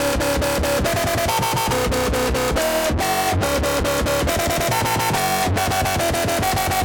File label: Wobble sample